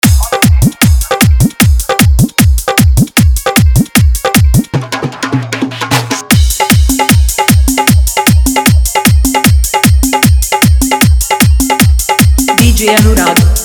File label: Bhojpuri Viral Fully Dhollki Bass Mix